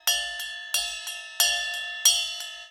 fx break bell Accent Pattern, cymbal sound; FX Bells; zil-bel

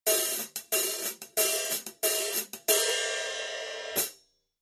14in Rock HiHats, cymbal

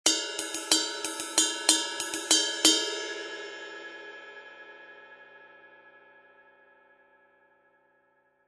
20in Custom Ride, cymbal

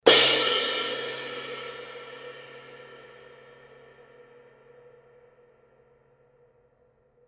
Kerope, cymbal sound

